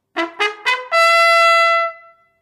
Ruf 3. Eskadron, Kavallerie

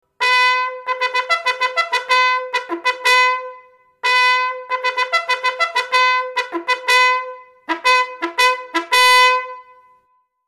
Alarm, Kavallerie

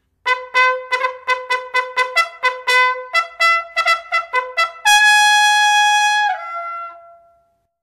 Adjutanten Ruf

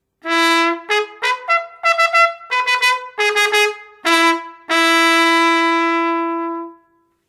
Ruf nach dem Gebet

